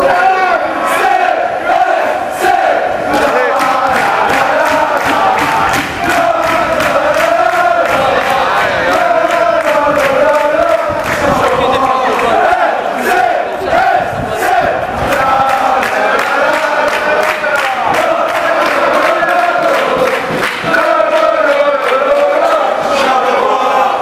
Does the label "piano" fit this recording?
No